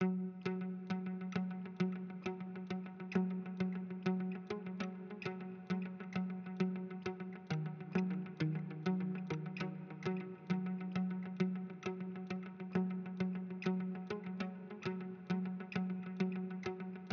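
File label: Guitar 100BPM F Major Bouncy Nice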